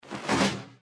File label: deathclaw swing; npc